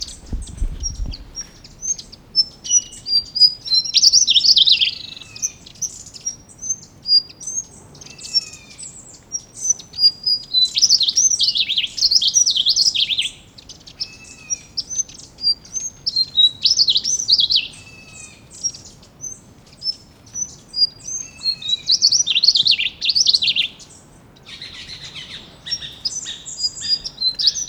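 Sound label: Slaty bellied tesia, bird; تسک شکم توسی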